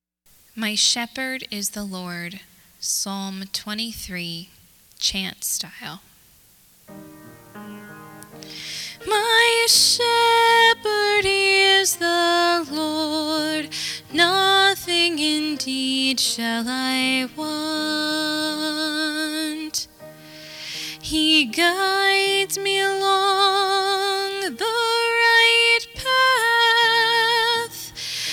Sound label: Psalm 23 - My Shepherd Is The Lord chant style